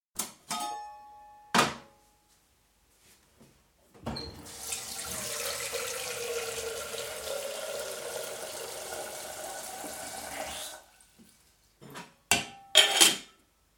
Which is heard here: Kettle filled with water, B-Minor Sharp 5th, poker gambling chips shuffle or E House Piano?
Kettle filled with water